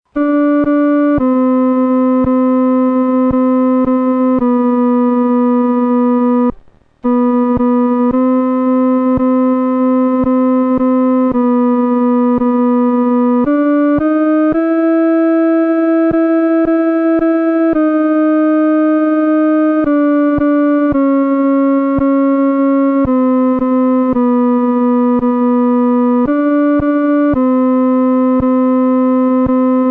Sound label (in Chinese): 独奏 第二声